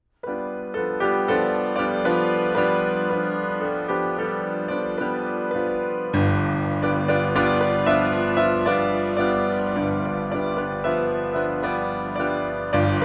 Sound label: Bell Dance